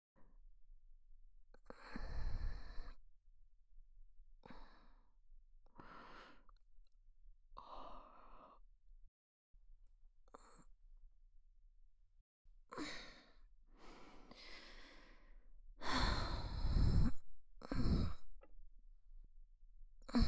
右 アイスドラゴンの寝息

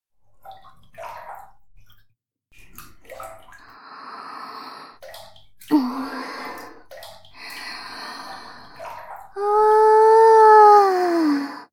お風呂でご奉仕フェラ バスルーム効果なし; SEあり

